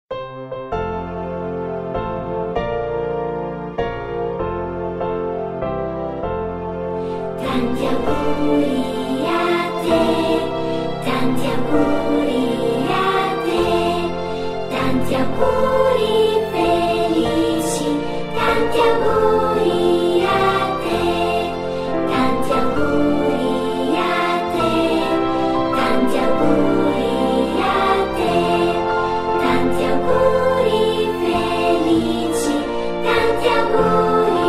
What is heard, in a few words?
italian happy birthday